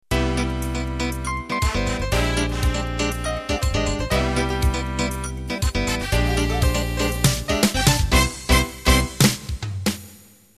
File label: Samba Ballroom Intro